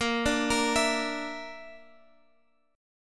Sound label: Bb Major Flat 5th Bb down Guitar Open G, chord